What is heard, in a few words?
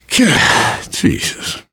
negative noise, survivor voice, biker